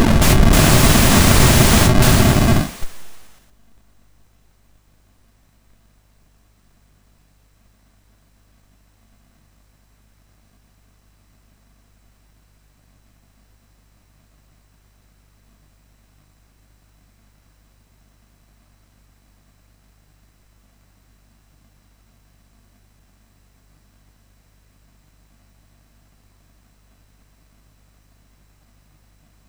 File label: Project Firestart, MOS6581R4